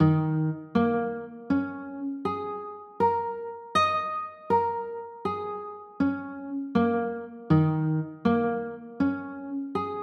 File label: d sharp 7sus4, chords